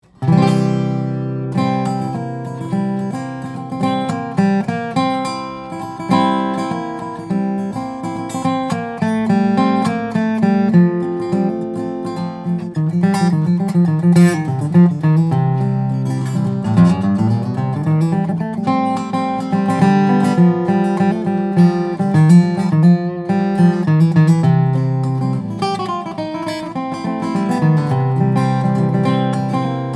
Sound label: Resonator, guitars; beard legacy e model rn cutaway; mastergrade maple; flattop